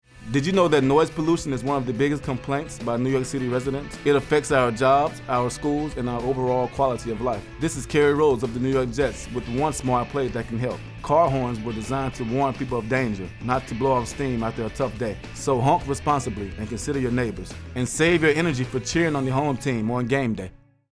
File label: rhodes noise